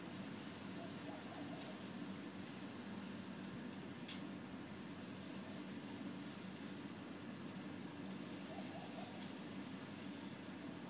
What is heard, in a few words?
Acacia Rattle